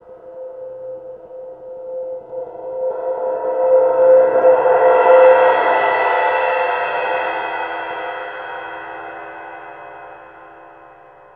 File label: Cymbal Swell; Sonic Foundry (Sony Creative Software); World Pop; Percussion